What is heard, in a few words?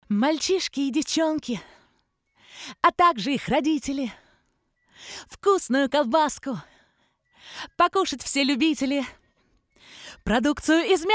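vocal; Sayanskiy myasokombinat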